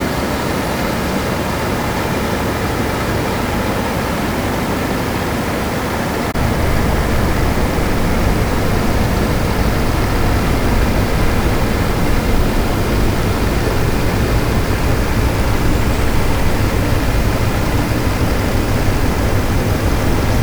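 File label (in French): test hydro ligne; Voile'Ocean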